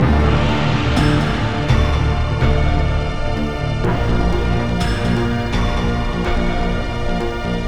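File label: Underworld; beta unreal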